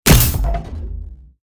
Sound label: hit big